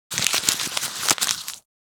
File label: Paper sheet crumpling rustling